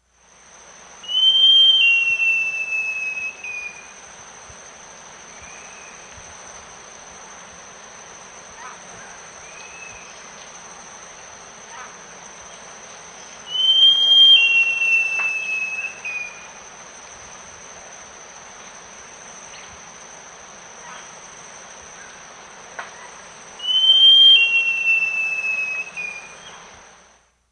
Great Jacamar; S. America

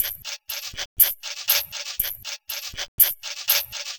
High Mix Beat, Electronica Electronic Beats

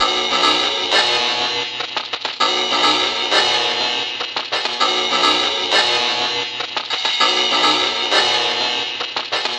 String Machine; Electronica

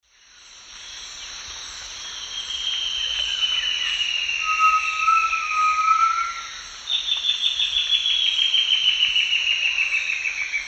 Myrmoborus lugubris, duet; S. America; Eastern Lowlands

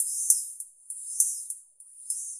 Pulsed Hats Beat

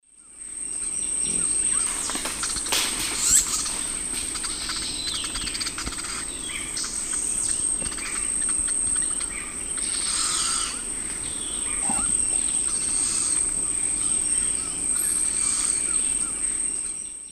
Piculus flavigula, begging calls; S. America; Eastern Lowlands

